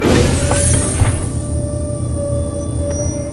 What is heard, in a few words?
door heavy open; svencoop; rngremake